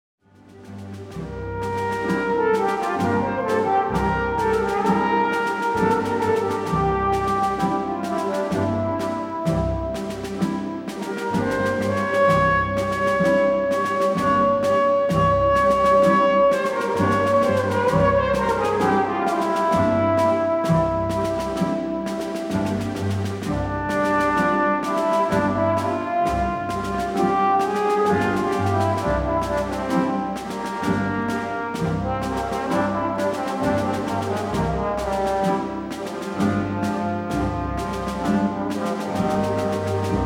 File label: Trombone; Ravel Bolero